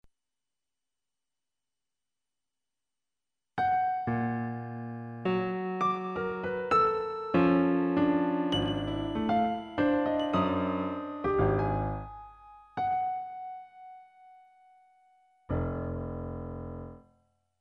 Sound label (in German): klavier; Algorithmische Kompositionen